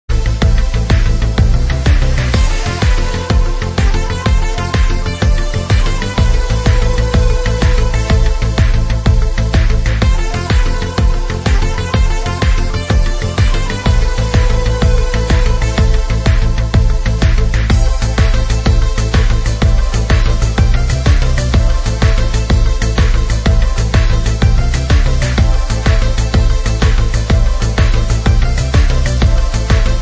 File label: Lazy Days Mix; Thunder Force